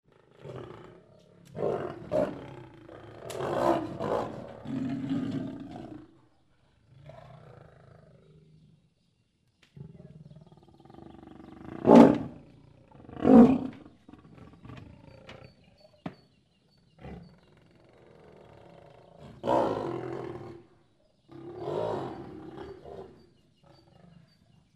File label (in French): rugissement de lion